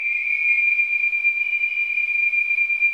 WHISTLING; Spectrasonics; Symphony of Voices

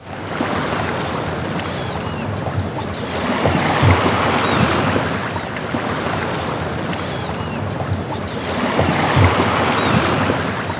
SEA, SEA-WATER

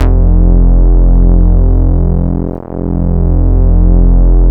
PROPH BS F2; Drums In Your FACE!

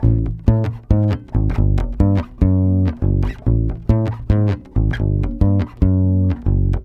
BASS GROOVE, Brazil Bossa; AKAI; USB Soundscan vol.16

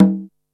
KOMMERZDRUMS

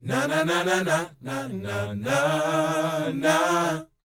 NA-NA D#; Spectrasonics Vocal Planet

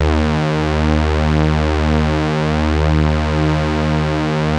TARK SAW, Synth; InVision Interactive; Keith Emerson; The Most Dangerous Synth and Organ; SYNTH LAYER

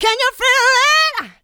Female Vocals; Houseworx

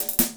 Snare and Hat Pickup

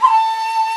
SHAKU, WOODWINDS; Roland - LA Composer vol. 1

